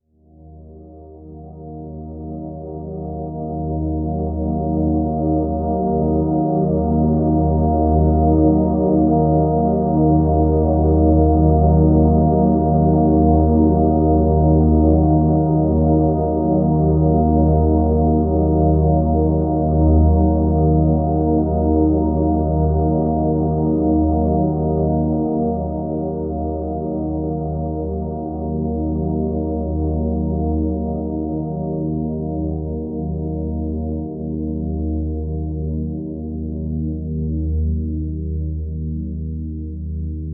wavetable pad warm analog

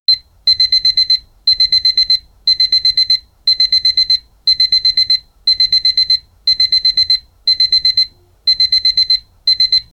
modern alarm clock sound

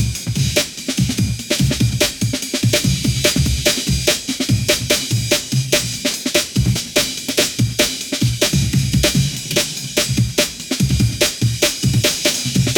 145 bpm drum and bass

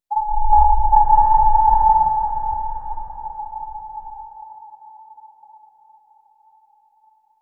inside a nuclear submarine

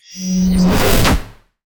black hole disruption

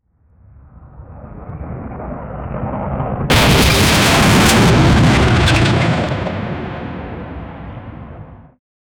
sound of a close range mi; collision

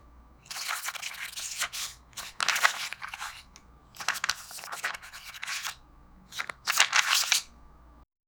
magical book of spells opening and flipping pages

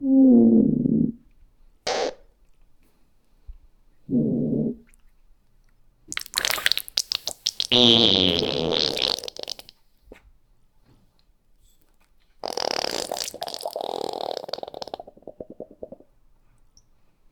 male chimpanzee suffering

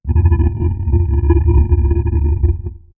deep mystical grinding sound, circle

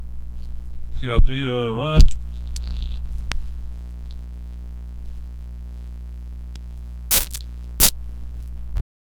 scary the hum of the; supernatural

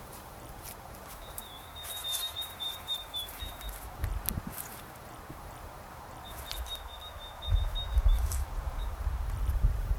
a calm meadow with soft, bird chirps

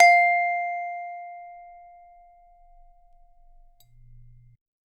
a clean dry audio recordi, single note

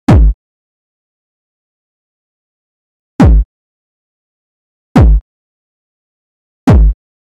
2d game jump sound effect

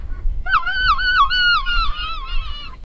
realistic seagull scream, beach

